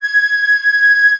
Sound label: flute